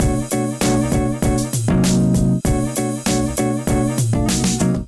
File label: Kit Melodic 98 bpm, Drums; MIDI Clips; Dobbs; Ableton; Chop and Swing